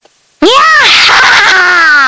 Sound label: nyeh hehehhee